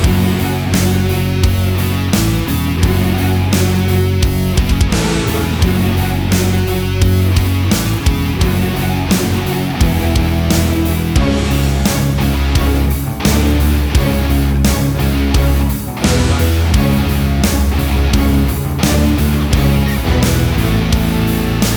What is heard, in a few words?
Big Heavy Metal Orchestral Fusion